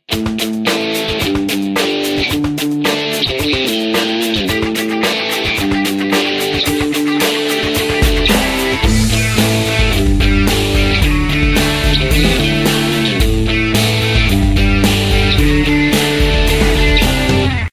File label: Metallic, ringtones; Alcatel OT-991D